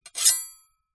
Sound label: sword small thin draw from sheath